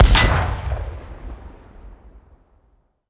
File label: armor pen; gmod; lvs framework